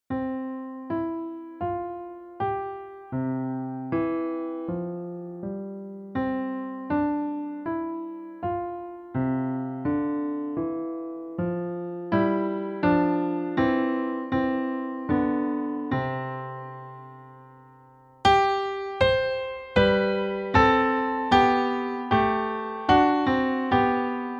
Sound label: Piano; How Sweet the Name